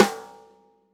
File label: rimshot snares on; JazzFunkKit